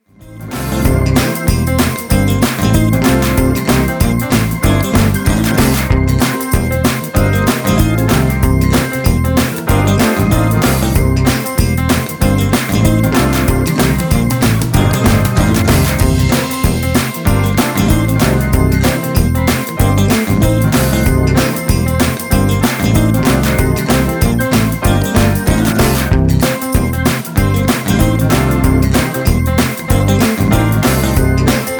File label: Instrumental